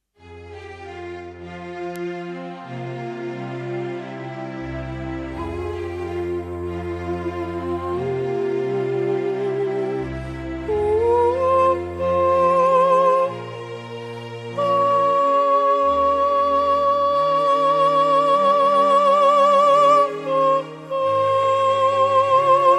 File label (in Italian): vocalizzo; per sopranista e strumenti